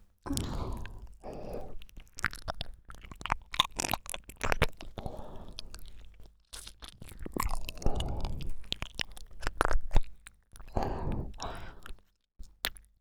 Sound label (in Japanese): 耳舐め; エッチしよ♪; 静華